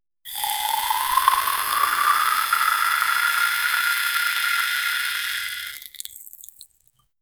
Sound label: making a cup of tea